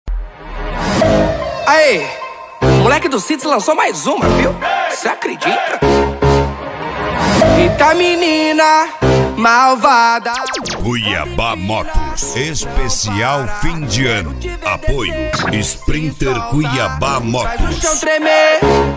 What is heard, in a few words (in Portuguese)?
deep e funk; cuiaba motos esp fim de ano